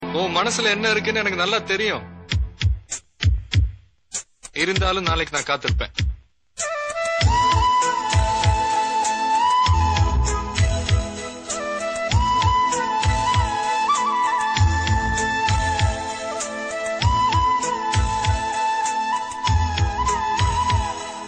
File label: Bgm